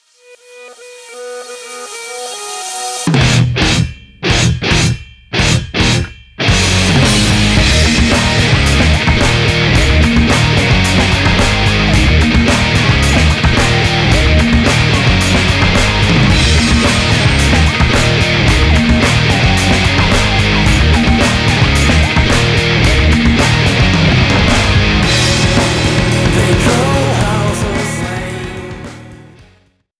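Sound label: background music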